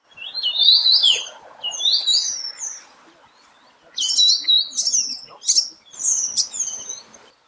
Taiwan Yuhina calls, Dasyueshan National Forest Recreation Area Heping District Taiwan